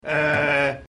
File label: euhhh